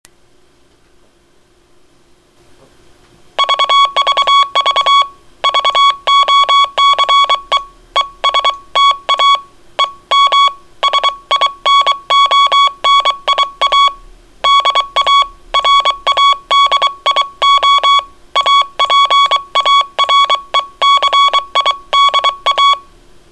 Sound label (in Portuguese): Morse; PY2MOK; 25 anos